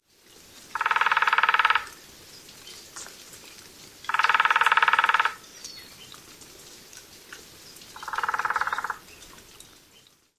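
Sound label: Nuttall's Woodpecker, drum; CA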